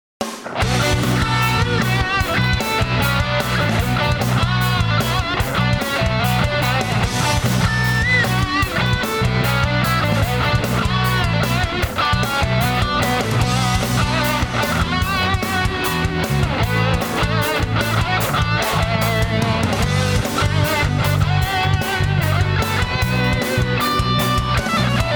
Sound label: Mesa Boogie Mark Five 25 Cab Clone